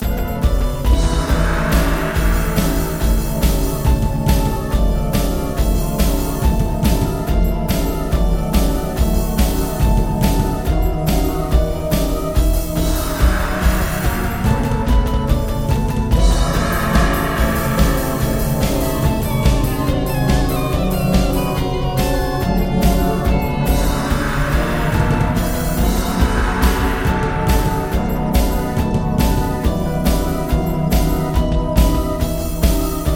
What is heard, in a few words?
transition, proteomusic